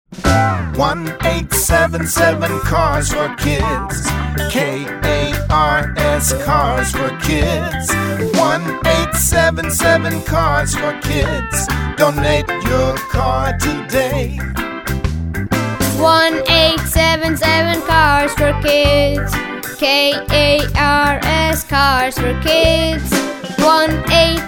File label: reggae; WFAN